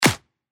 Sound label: Clap; Veracocha; revealed claps snares vol 2